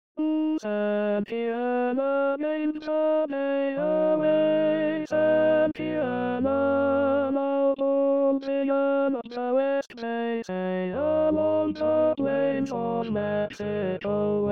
tenor voice male in B

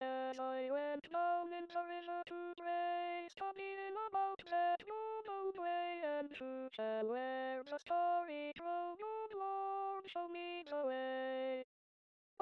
SATB Bass

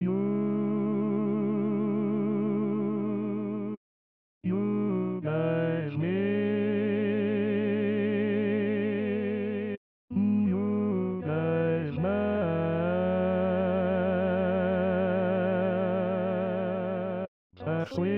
Mid in Cm

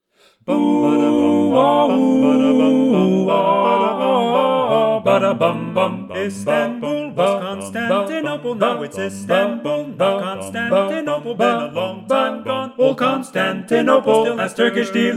Baritone predominant, ttbb

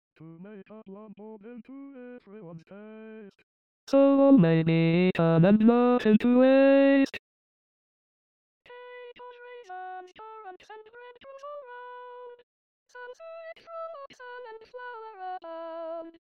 with piano Tenor

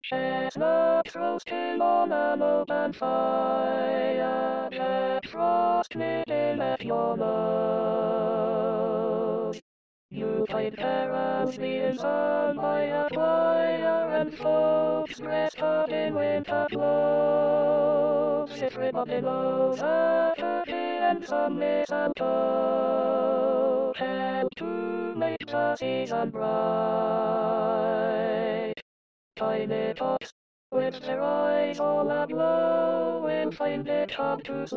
Alto SATB

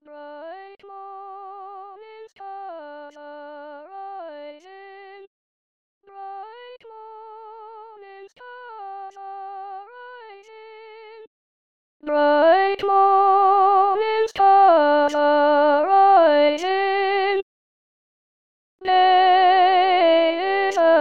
bright morning stars ssa Mezzo

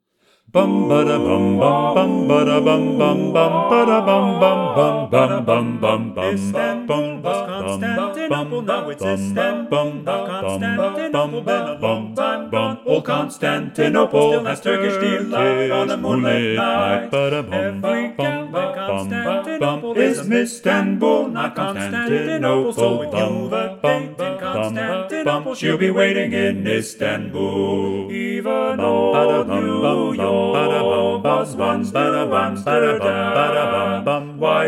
Bass predominant, ttbb

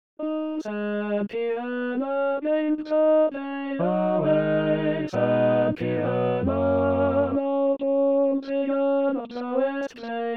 santiana voice male in B

